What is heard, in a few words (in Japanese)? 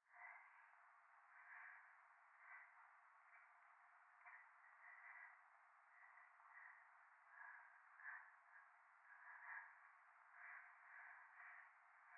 夢の中 右耳快感吐息 雨